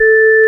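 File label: ORG Vox, Continental organ; Keyboards of The 60's and 70's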